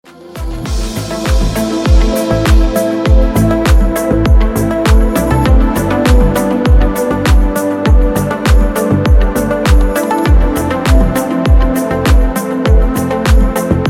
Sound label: space melody